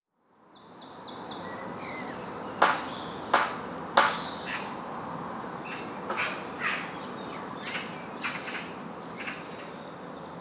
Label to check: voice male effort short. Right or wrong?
Wrong